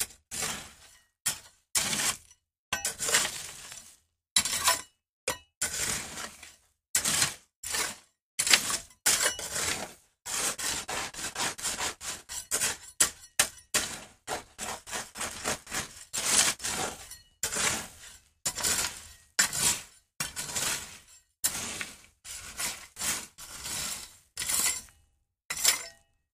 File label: Rake Gravel